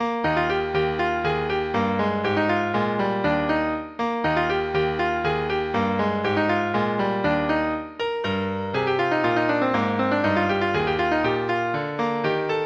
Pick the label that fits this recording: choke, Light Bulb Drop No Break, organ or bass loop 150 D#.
organ